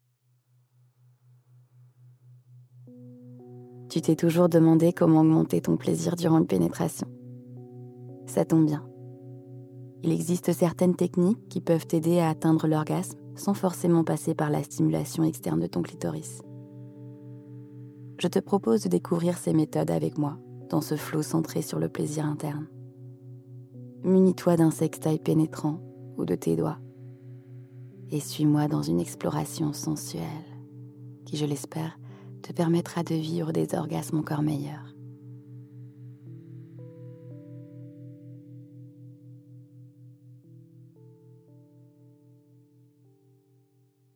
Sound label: inner flow with music and binaural beat